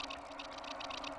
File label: Warp Wave Drum; Rhythmic Atmospheres